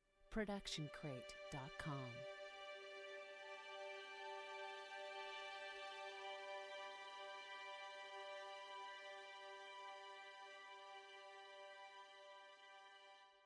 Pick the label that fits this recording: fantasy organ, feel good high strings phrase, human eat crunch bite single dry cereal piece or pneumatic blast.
feel good high strings phrase